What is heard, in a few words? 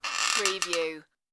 metallic bed squeak